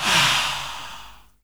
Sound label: WHISPER AH; Spectrasonics; Heart of Africa 1; WHISPERS